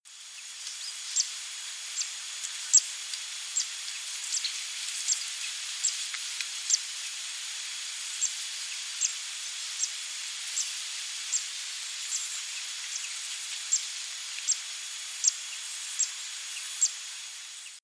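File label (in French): cisticole des joncs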